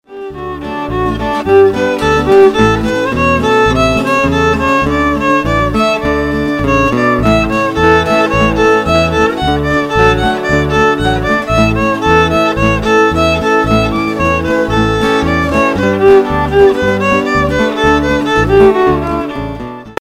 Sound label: Fisher's Hornpipe